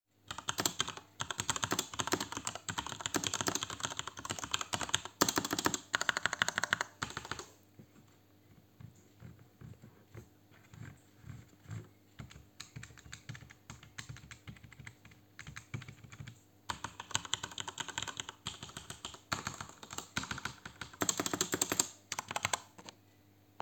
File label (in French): thermaltake argent k5 rgb enregistrement, claviers